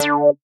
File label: synth